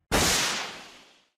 Laucher

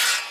Blade Cut

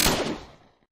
Turret

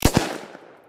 Revolver

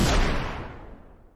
Heavy Rifle

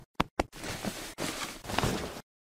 Cloth Wear

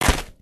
Sand Impact Bullet

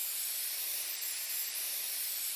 Water Gun Loop

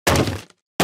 Wood Plank Impact Hard

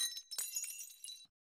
Cup Break

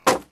Wood Box Impact Bullet

